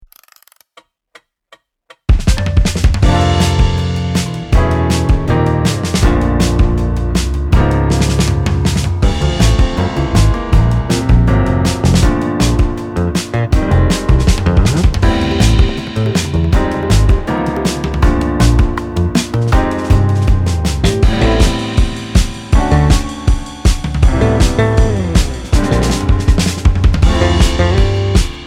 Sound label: chord